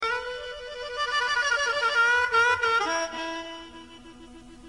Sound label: harmonica